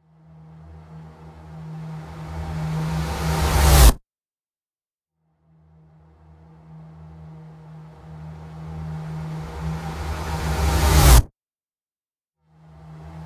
Cinematic Designed Sci Fi Riser Orbit

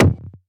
Crumpled Kick

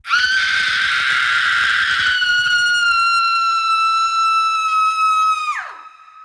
Woman Scream

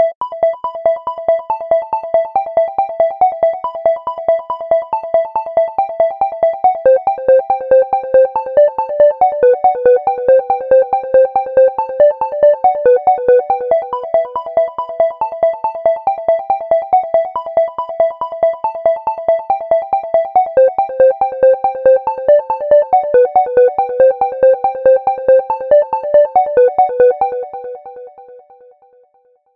Synth Melody 140 Bpm

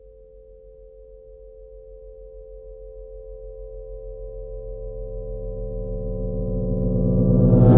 Reverse Soft Impulse Metal Light Long

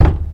Thump

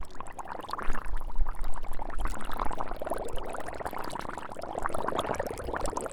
Bubbles In The Pool

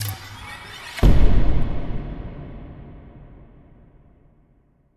Slide boom

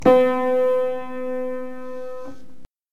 Piano

